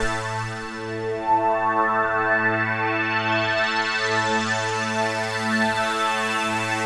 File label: TECHNO PAD 140; Keys